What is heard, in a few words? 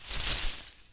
bush rustle